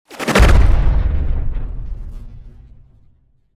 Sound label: energy implosion